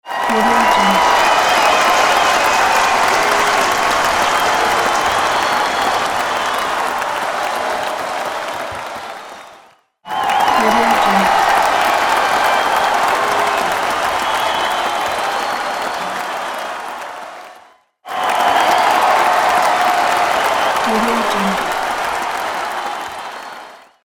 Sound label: applauding and crowd cheering